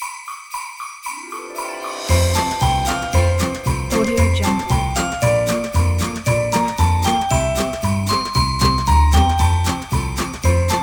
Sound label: jingle bells